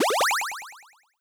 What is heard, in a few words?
power up item fast pitch bend up